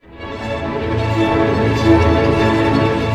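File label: arpeggio A major, strings; Sound On Sound - Peter Siedleczek's Orchestra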